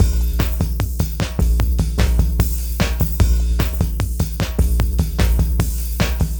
DEEP ELECTRO ACOUSTIC GROOV, PROCESSED ACOUSTIC DRUM KIT; Sonic Foundry (Sony Creative Software); Vir Unis Infusion